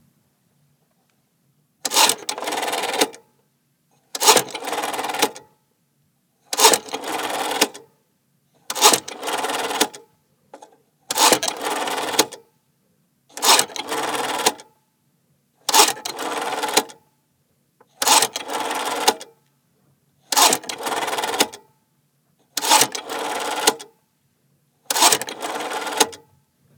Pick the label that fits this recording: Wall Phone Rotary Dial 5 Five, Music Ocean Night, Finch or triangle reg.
Wall Phone Rotary Dial 5 Five